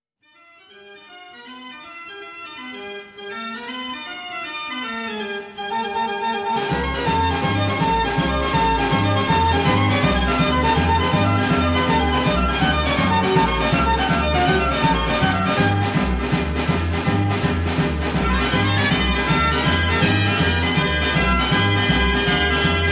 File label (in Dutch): Twist, draaiorgel; De Veronica